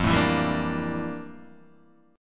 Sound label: F Major 13th Guitar Open D